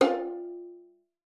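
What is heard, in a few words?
finger F5, violin; Xsample VOL-5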